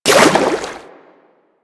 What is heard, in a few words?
Aqueous Discs Hit; Warframe; Yareli